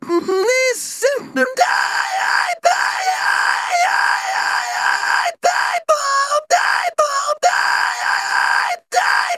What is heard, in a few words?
rock